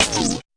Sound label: fx drum